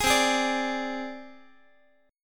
C Suspended 2nd Sharp 5th, down, Guitar; Csus2#5 strummed; JGuitar

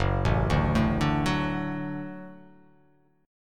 Gb Minor Sharp 5th Gb; arpeggiated; JGuitar

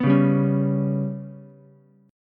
G Major Flat 5th Ukulele, chord; GMb5 strummed; JGuitar

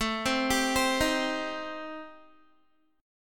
A-Minor 7th Flat 5th, Guitar, chord; Am7b5; arpeggiated; 17,15,17,17,15,x

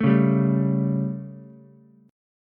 G Suspended 2nd Flat 5th G Ukulele; Gsus2b5 strummed; JGuitar

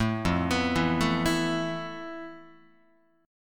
F Major 7th Flat 5th Guitar; arpeggiated

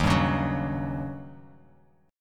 D sharp 7th Sharp 9th; strummed; D#7#9; 11,10,11,11; JGuitar

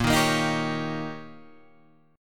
D sharp 7th A sharp, guitar

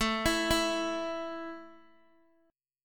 A 5th A down Guitar; arpeggiated

